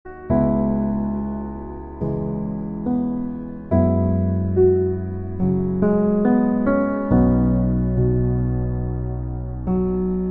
Romantic Bgm

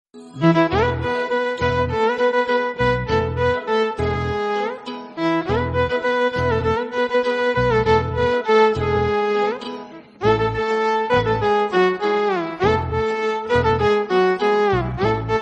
Instrumental, ringtone

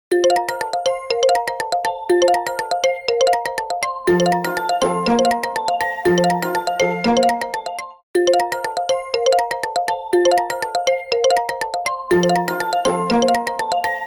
Xiaomi Poco C55

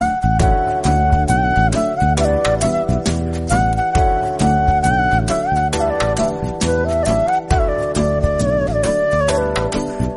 Flute, ringtone

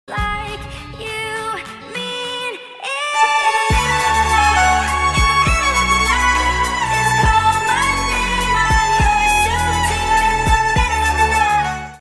Violin

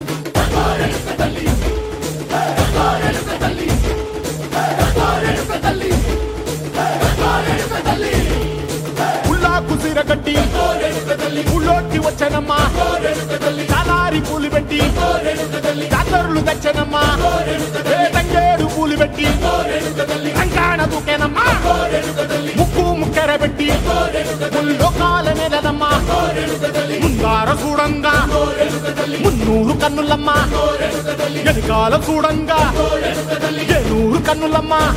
Ringtone

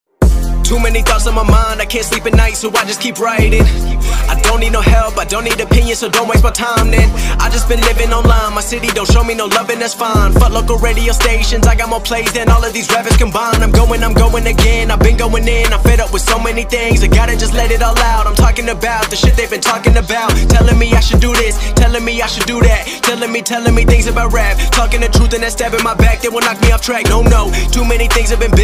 English Rap Song

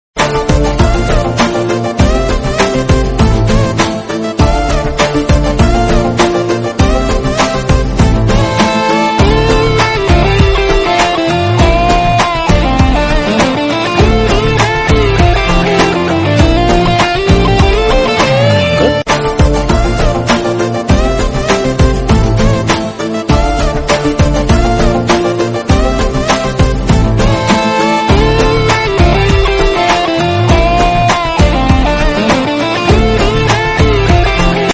Telugu Movie Bgm, ringtone

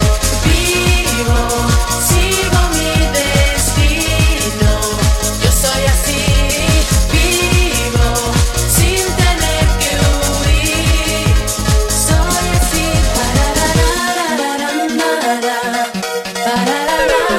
Vivo Tone

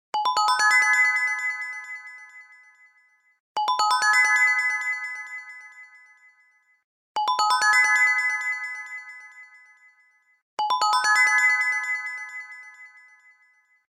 Huawei Nova 10 Youth, ringtone

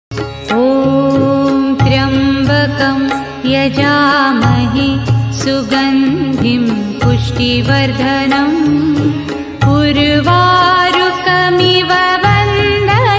Om tryambakam yajamahe male

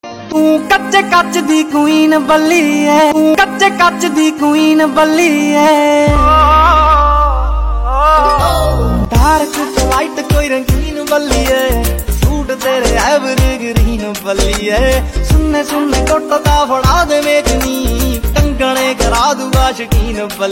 Punjabi, ringtone; Tik Tok

